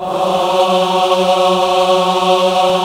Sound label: AAH, VOCAL